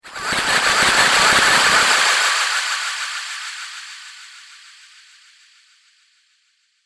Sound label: Laser Plasma Wash, sci-fi laser sound effect; Laser Future